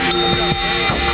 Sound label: win